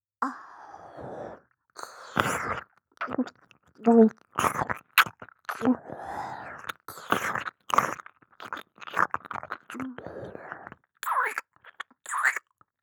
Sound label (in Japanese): 耳舐め; アスティリア